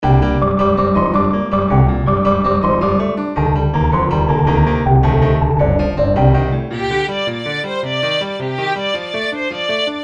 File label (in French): La courante